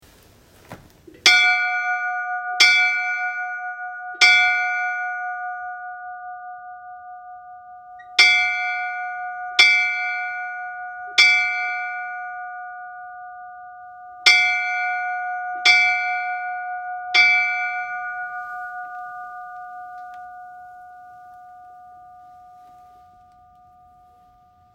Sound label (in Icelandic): kalfafellsstadarkirkja 3x3, kirkjuklukkur